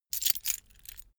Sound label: key ring large put down; objekte; schluessel